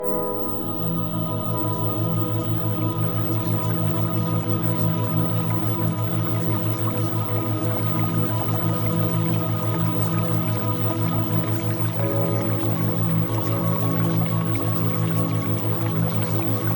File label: Music Theta Waves; Premium Subliminals